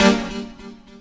SYNTH GENERAL; Megabass Remix! 2

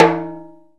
rimshot Timbales; E-MU Producer Series Vol. 2 – More Studio Essentials; Composer; Timbales Xswitch

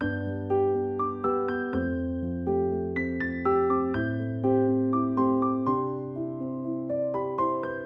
Cmaj 122BPM Piano; 99Sounds; Monosounds; Music Loops; PANDORA BOX!; Palm Vibes

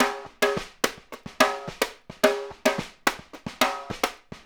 Snare Pattern, Drums; Essential Percussion

